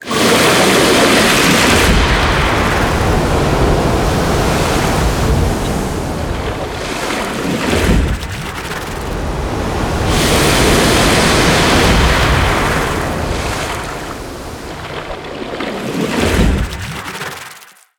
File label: ocean waves; centralperkproduction